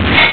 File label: launch; Copter 1.1; Arcade